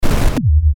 static bass; Static Bass Hit; Production Element Imaging Element Accent Transition; Blastwave; Static Sound Effects

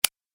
Robot Eyebrow Moves; Robot Sound Effects; Sci-Fi Robot Sfx; Andrew Fleming

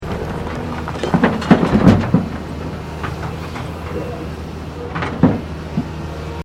Construction Earthmover Unloading Into Dumptruck

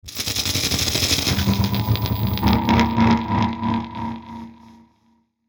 static burst; Static Burst Warble Pulses; Production Element Imaging Element Accent Transition; Blastwave

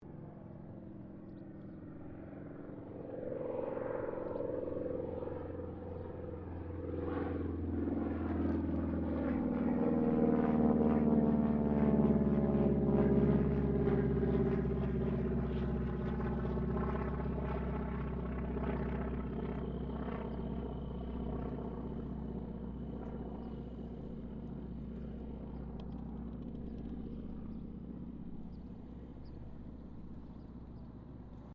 AIRCRAFT AT-6D HARVARD MK III PASS DISTANT; Temora Harvard MK III; Airplanes

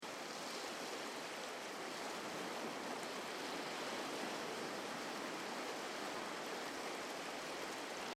Ocean Beach Sound Of The Sea Surging Billows; Ocean - Beach - Sound Of The Sea - Surging Billows 4; CraftedSignals

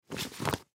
High Heel Boots Zipper Pick Up Cement; Shoes (Sound Effects:Objects); Blastwave; Foley

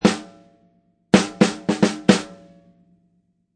Snare Fill, Snare Fill Loop at 110bpm.; Drum Loops (Royalty Free Music Loops:Music Production Loops)

